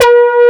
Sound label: AN SYN, SYN & LEAD; SAMPLE WAVE MIXING 2